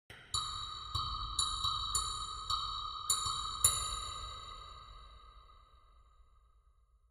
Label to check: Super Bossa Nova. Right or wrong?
Wrong